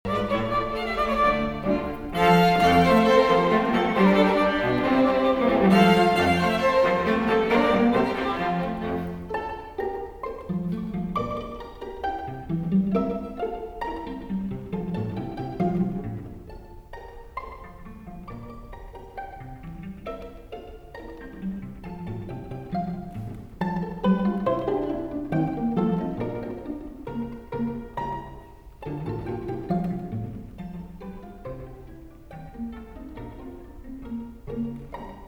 Presto in F, streichquartett; Mozart

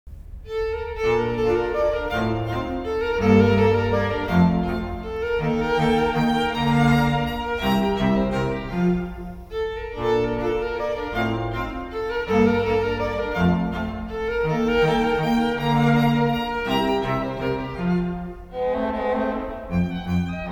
Menuetto, streichquartett; Haydn Menuetto Sonnenaufgang

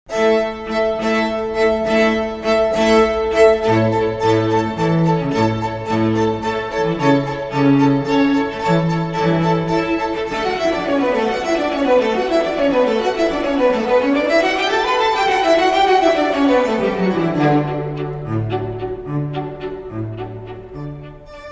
Walzer, streichquartett; Gounod Faust Walzer

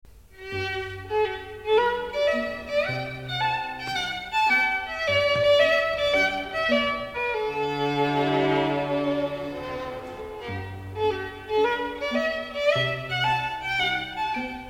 Streichquartett; Dvorak Humoreske

